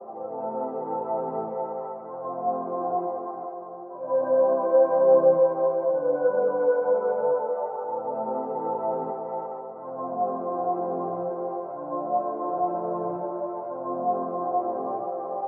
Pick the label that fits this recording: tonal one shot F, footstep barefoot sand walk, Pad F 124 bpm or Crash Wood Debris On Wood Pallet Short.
Pad F 124 bpm